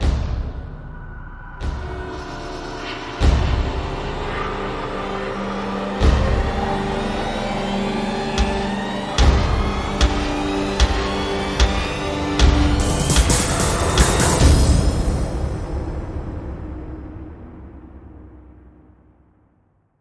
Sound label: Hit and Hold Riser Build